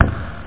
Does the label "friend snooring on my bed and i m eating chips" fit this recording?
No